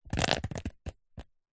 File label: water drips short sudden onto umbrella; nature